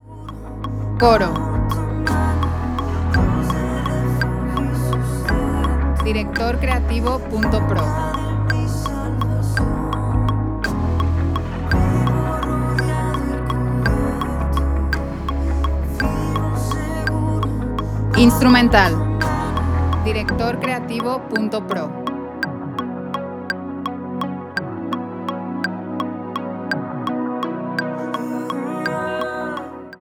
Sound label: Lead B 84 6 8